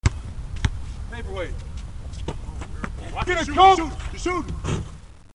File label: BASKET, Basketball game